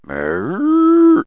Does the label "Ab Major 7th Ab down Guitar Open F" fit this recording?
No